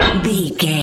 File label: manhole metal door lid impact